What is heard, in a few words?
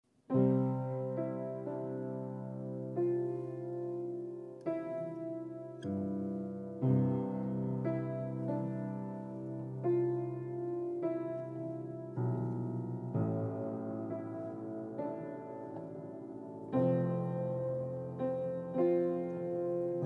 Piano